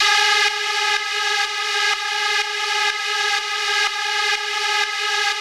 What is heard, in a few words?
warm synth processed; kern audio